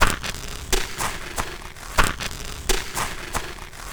Crunchy, Beats; Sonic Foundry (Sony Creative Software)

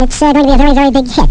big hit; Amiga Soundtracker Sample Packs

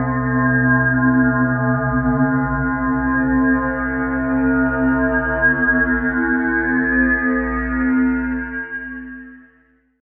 RESO GONG; Distored Reality

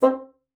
bassoon, C4; Xsample VOL-6

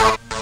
techno syn A, synth notes; Techno Trance Essentials

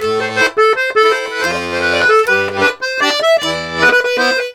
LARIDE, Accordions; USB Soundscan vol.40; AKAI

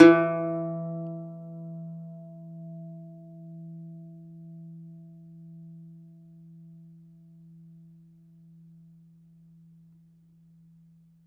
DOMRA, Plucked Strings; E-MU Producer Series Vol. 7 – Old World Instruments

